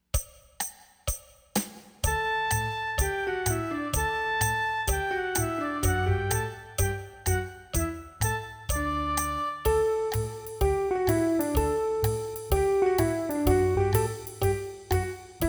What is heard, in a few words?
Swingin Musette